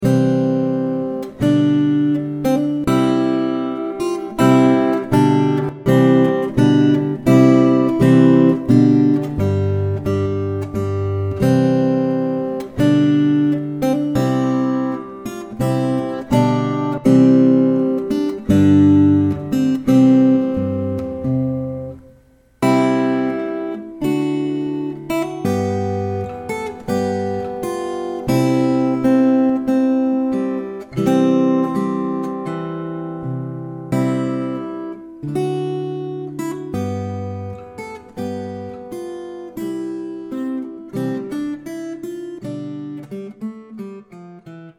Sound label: Trumpet Voluntary; with fade